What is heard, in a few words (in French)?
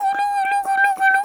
gouloumh